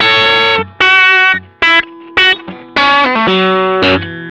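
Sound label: blue riff, Vintage Blues Guitar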